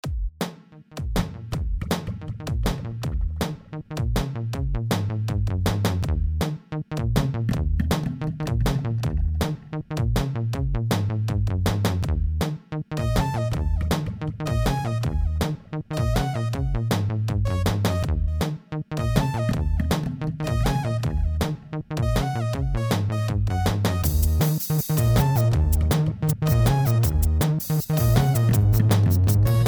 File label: drum bounce